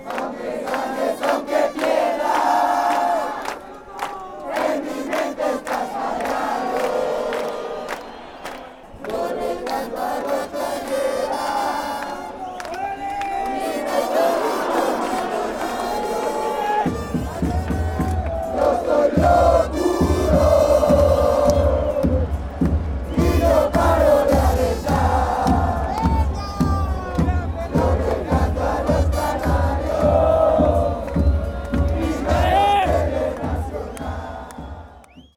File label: canto, fan chants; morelia